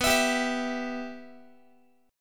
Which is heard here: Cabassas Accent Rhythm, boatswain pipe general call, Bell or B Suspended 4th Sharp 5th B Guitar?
B Suspended 4th Sharp 5th B Guitar